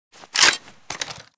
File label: first pullout; Titanfall 2